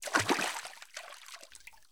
Water Splash Soft

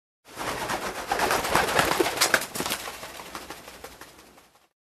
Chicken flapping wings

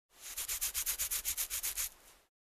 Cleaning using mini brush

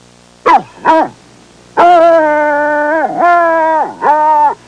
Bloodhound barking sound effect long

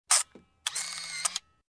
Taking a picture with camera

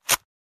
Rip of small label very quick

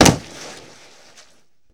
Body Slam on wet surface

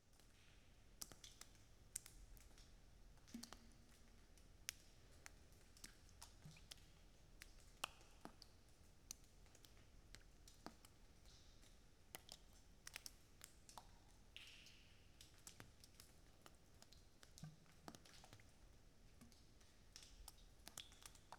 Cave Interior with Dripping Waters

